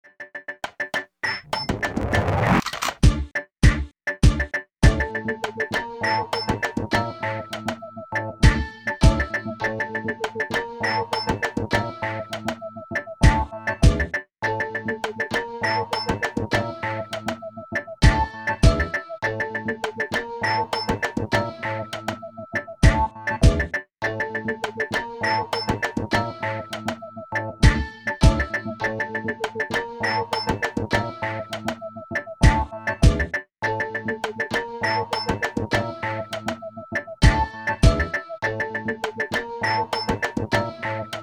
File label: beat five